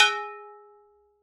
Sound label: dish